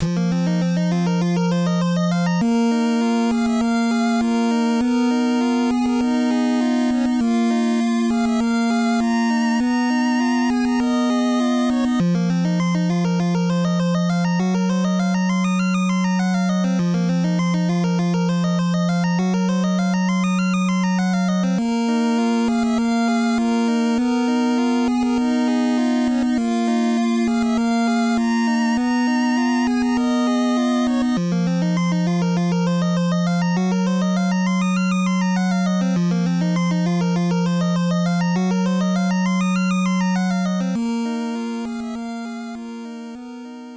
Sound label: Cave; Getsufuu Maden; NES